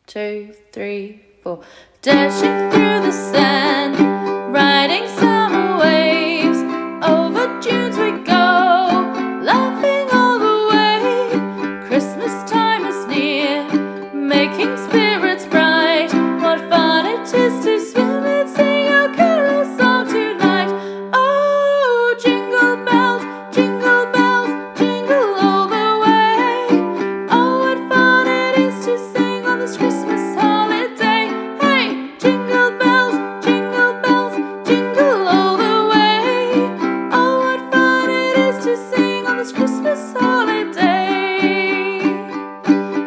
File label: Uke jingle bells; Uke book kiwiana